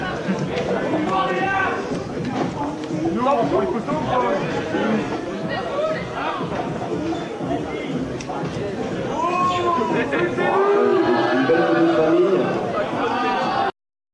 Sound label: Coff